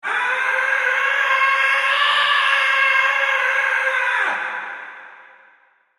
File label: Horror Scream Processed Effect Male; Screaming; Voice